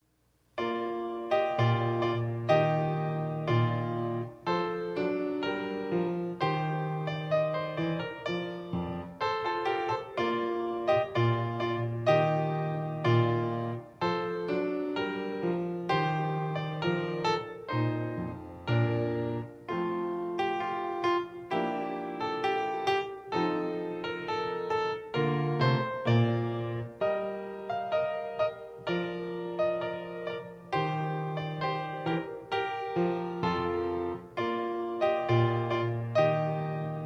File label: chorale piano; St Anthony Chorale